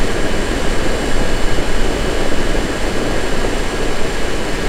King Bill; New Super Mario Bros. Wii

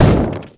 Thowmp; Wii

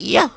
jump danger; Mario; Super Mario Sunshine

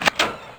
Door

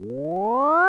Mushroom Grow; New Super Mario Bros. Wii

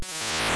die; Super Mario Land

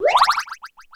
Blooper

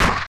Egg Pop; Yoshi; Super Smash Bros

